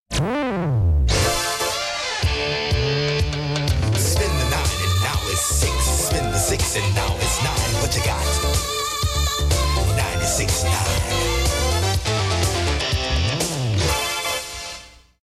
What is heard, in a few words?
Jingle